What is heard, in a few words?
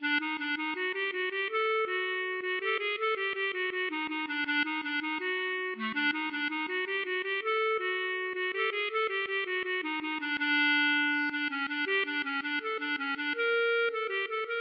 klezmer